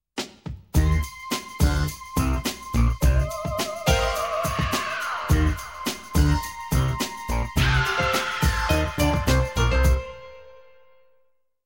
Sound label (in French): suspens